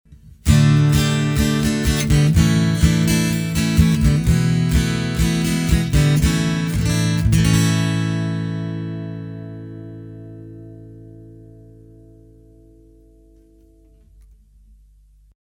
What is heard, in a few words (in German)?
Gitarre